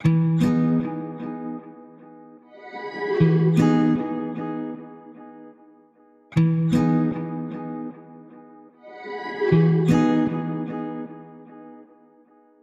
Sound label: 76 BPM E Maj Guitar; Lofi; Melodies; Loop Stems; Cymatics; Lofi Starter Pack; Mountains